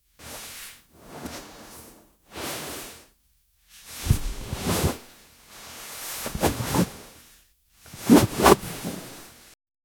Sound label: imposing cloak rustle